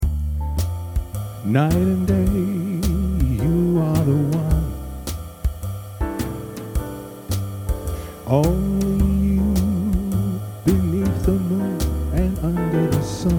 Jazz

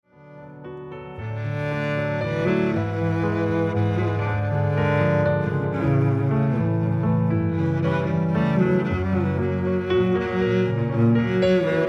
piano and cello; Love Ballade; jazz

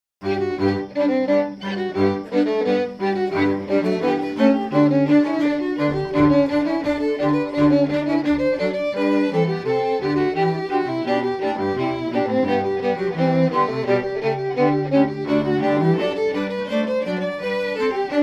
TRIO, String Quartet

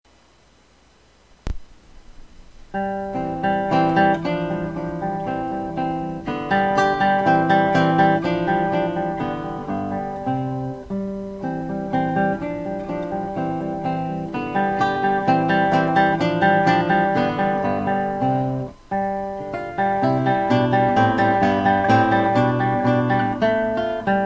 Acoustic Guitar; English Dance

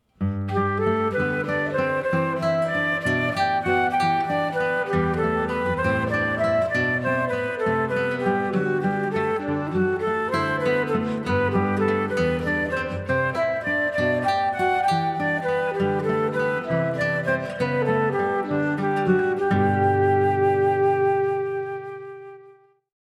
Guitar; Joy Bach

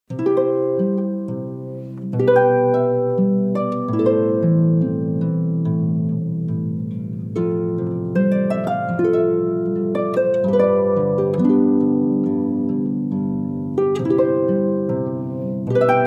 Harpists; Classical

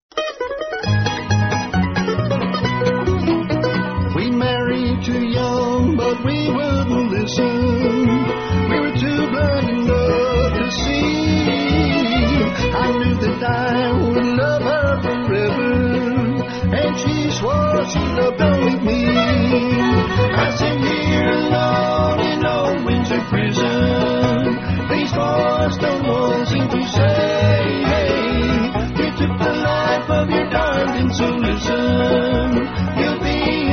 Bluegrass Band; Old Windsor Prison

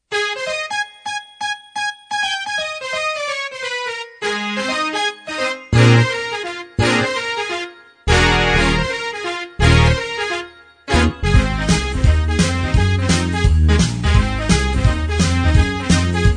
Big Band, One Man Band

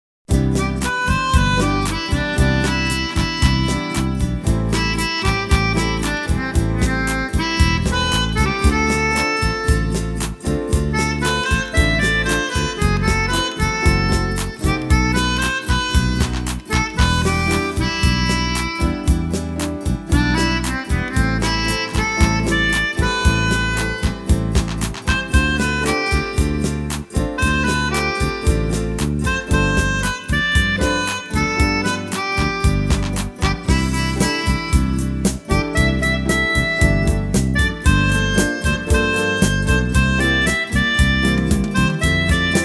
San Marta (tropical)

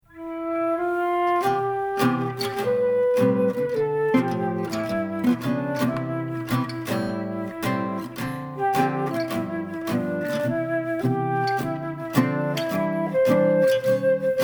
w Flute, jazz guitar

